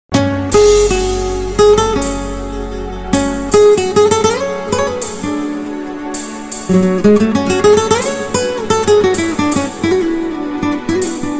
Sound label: spanish guitar